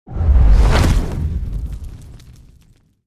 Super power punch

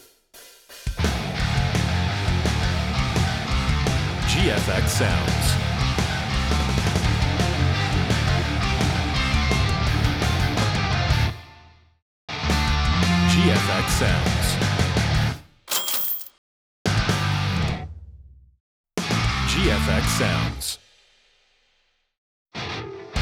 rock sfx pack; Twitch

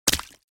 Footsteps on wet street shoes single step

